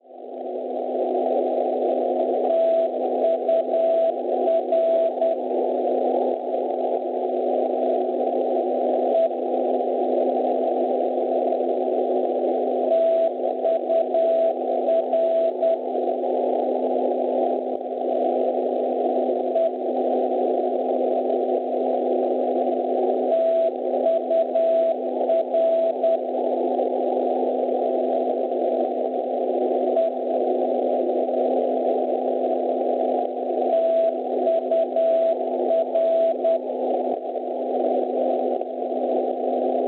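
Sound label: NDB non-directional beacon, 331 kHz; TUR; Tours; Francia; Schwarzsee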